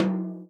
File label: tom, Real Drum Kit, jungle kit